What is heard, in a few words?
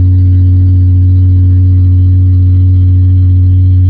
Lightsaber Idle; CastleMiner Z; Xbox 360